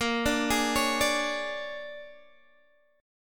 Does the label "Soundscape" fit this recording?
No